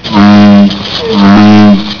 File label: bullfrog